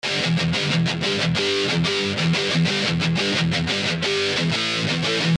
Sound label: Stereo El Guitar After Clean